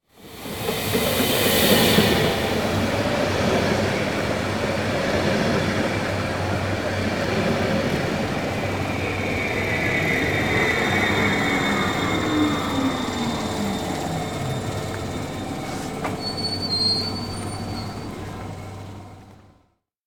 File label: train pulling up; Golden Crayon Sounds